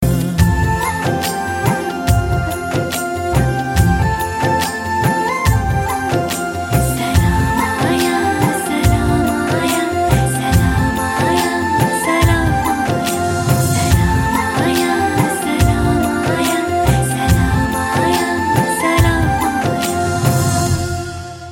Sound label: Soft Music